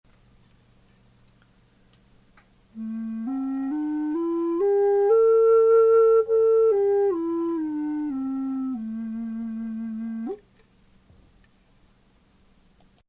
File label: fin low A, native american flutes